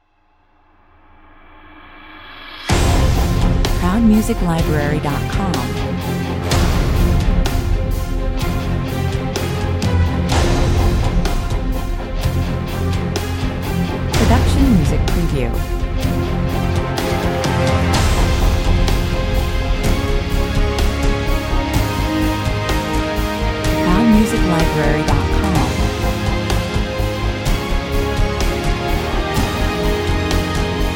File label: no choir, A seriously dramatic, epic, cinematic track; This track keeps building in intensity and urgency towards a majestic climax towards the end.